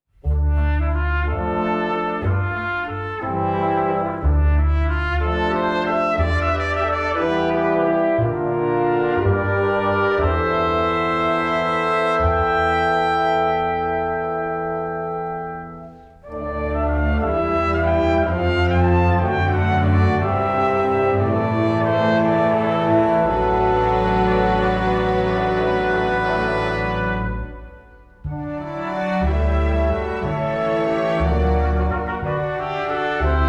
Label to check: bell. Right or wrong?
Wrong